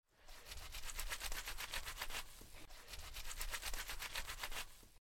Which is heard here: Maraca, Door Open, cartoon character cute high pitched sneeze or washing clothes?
washing clothes